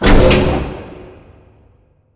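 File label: Assembly Plate Lift Impact Metal Distant; Boomtown; Titanfall 2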